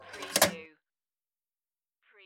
Pinball sound, little slide ends with metal obstruction